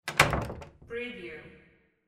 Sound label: door close